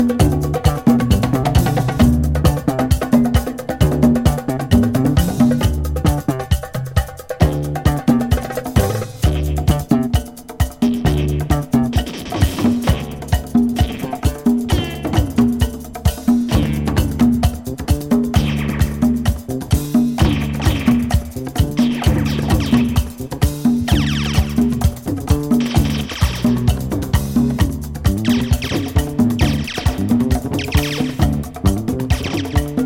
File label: Drum Sequence